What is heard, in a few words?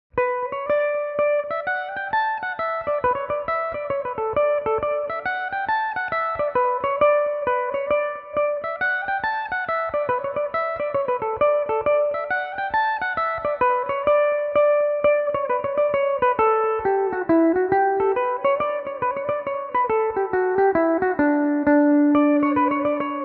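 D major, Irish mandolin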